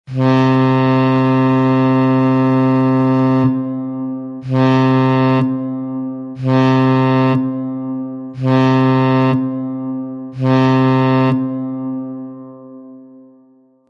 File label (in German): Schallsignal See allgemeines Gefahr Warnsignal